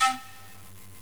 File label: Breath Noise